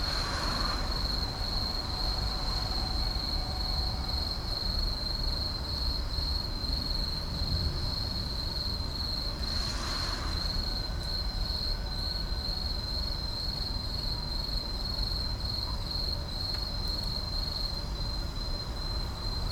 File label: Urban park night insects; urban and suburban; boom library